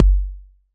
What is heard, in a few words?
kick machine